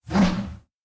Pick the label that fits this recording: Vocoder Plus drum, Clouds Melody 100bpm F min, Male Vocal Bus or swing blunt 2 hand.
swing blunt 2 hand